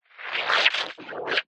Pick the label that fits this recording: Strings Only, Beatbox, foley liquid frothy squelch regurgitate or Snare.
foley liquid frothy squelch regurgitate